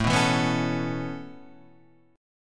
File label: Db Minor 7th Sharp 5th A, chord